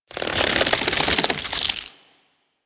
Nunchuck Finisher Tension, Weapons Infested; Warframe

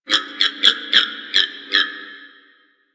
Creature; Warframe; Quests; TheNewWar; Ambience; Drifter Camp; Creatures

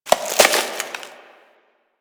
Tree Branch Break; Ambience; One Offs; Warframe; Quests; The New War; Drifter Camp

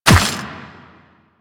Run Dirt, Footsteps

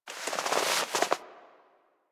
Classroom Move Foley; Ambience; Warframe; The New War; Quests; Zariman

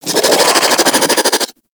Infested Crawler Attack; Warframe

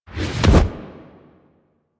Camera Latch; Warframe; Chimera Prologue

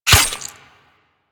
Melee Dagger Impact Flesh; Warframe; Weapons; Daggers

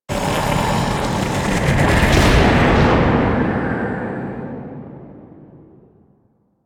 Operator Fall Off Cliff SFX Beam Feedback; The War Within

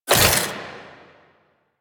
Scrap Pickup; Warframe; Missions; Zariman; Zariman Assassinate

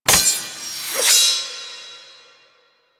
Nursery SFX Frame Knock Sword; The War Within; Teshin

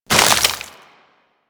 Melee Impact Wood; Warframe; Weapons

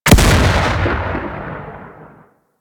Anti Aircraft Gun Projectile B; Weapons; Warframe; Archwing

